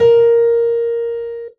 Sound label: Piano